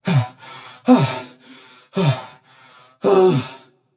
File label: nv breath; smite; xbalanque